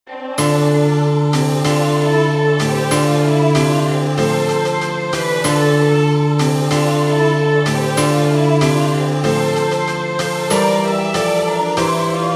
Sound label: god rest ye merry gentlemen orchestra